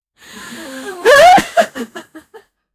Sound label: audience gasping in uniso